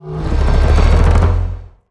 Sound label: near win quick